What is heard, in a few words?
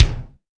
BD RM; EdgeSounds; Drum Mashines; R-8 DRUMS